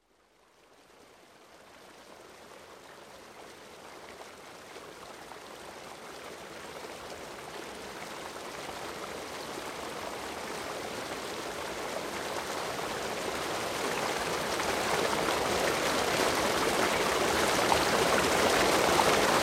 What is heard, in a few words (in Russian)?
Zvuki Prirody Vodopad